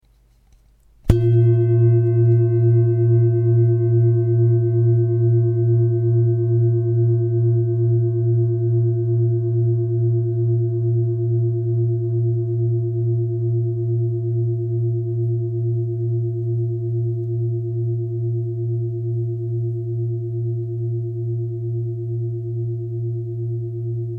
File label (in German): Klangschale; 2358g